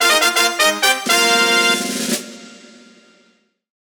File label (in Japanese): ドラクエレベルアップぽい効果音; ドラクエのレベルアップ風効果音